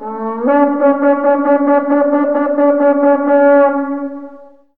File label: jackpot super; DW How to Train Your Dragon